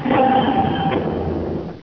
sliding block

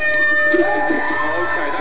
airhorn

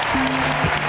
mob; france

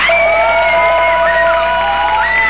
dings; usa

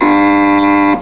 wrong

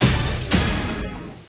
lose

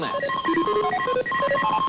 audience

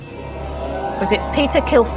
chyron

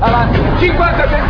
openbox; italy

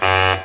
times up

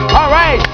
grab

